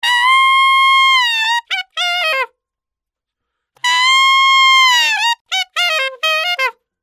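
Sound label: 126 Cm Clean Sax Loop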